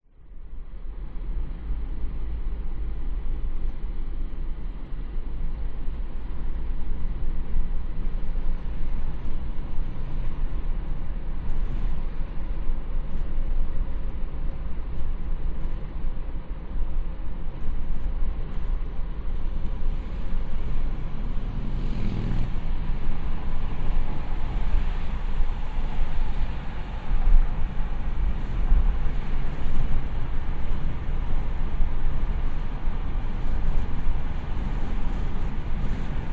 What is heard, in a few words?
vehicles car driving 100kph internal windows open slow stop then away; toyota corolla